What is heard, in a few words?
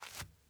dirt jump